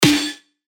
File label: Snare; Sambo; revealed claps snares vol 2